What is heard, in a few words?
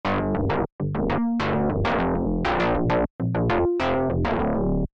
shape fold filtered gain; warm distortion